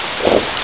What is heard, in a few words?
Unknown sp Sound